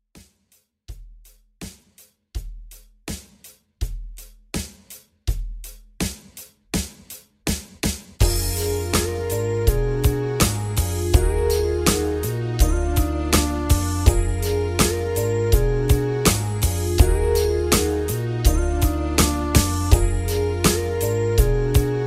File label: 90s R B Redrum 82 BPM